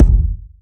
reverb bass drum single shot f key; kick drum samples

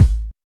clean kick drum one shot g sharp key

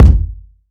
verby bass drum g key

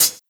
crunchy foot hi hat sound g sharp; g sharp key

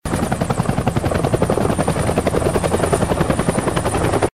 helicopter whirring

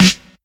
clean snare sound f sharp key

